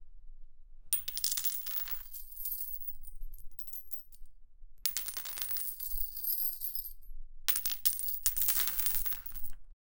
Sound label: gold bars droping on the